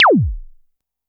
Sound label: korg trident